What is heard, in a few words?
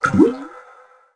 Pop; care bears catch a star; macos windows gamerip 2005